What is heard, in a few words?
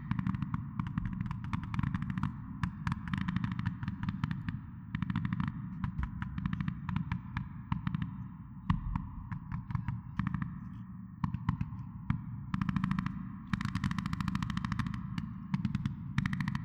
War Ambience